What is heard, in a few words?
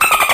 dolphin bleep; spongebob